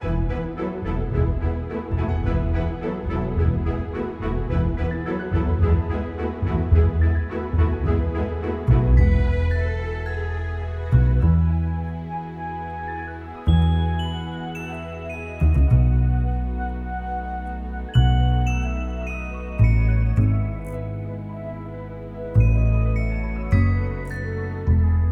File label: karaoke